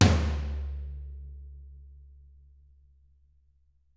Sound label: TT, Toms; Bob Clearmountain Drums I